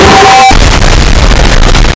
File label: bite and explosion; beepteebops